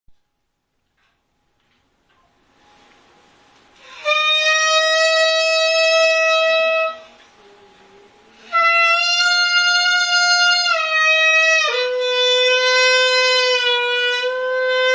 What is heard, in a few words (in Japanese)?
hichiriki dokusou; ensoukai